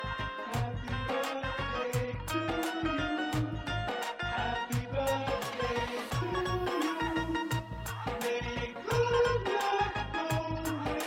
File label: birthday punjabi